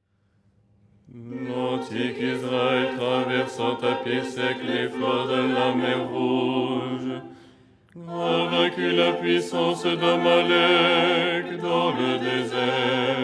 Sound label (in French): basse; 1er hirmos du canon du dimanche, 1ère mélodie bis (petite variante à la fin).; Les 8 tons en français; ton 4